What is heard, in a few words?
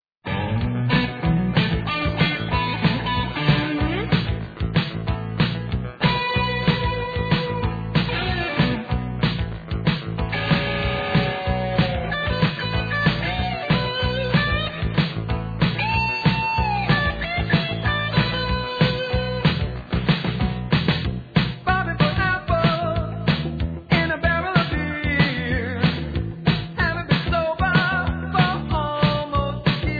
lofi back bobbing